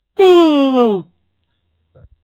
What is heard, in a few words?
a shocking middle aged pe, shock